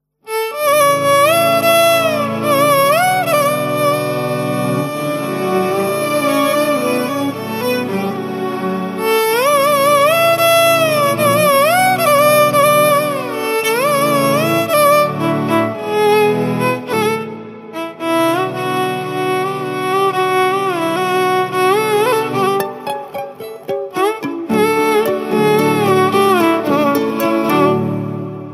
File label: Violin Cover